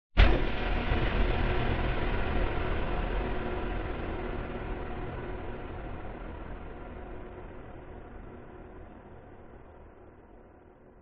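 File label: sounds of unpleasant shock in a person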